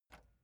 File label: metal button spring release